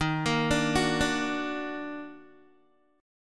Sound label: Eb Minor Major 7th Eb down Guitar, chord; EbmM7; arpeggiated; JGuitar